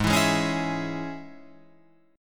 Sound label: G sharp Suspended 4th Sharp 5th G sharp, Guitar Open F; strummed